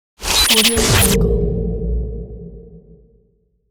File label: intro glitch